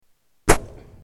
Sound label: hit; waluigi